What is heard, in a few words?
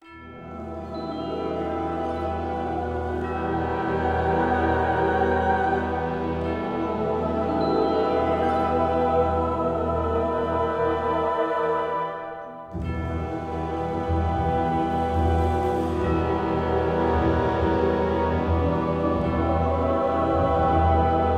mountains music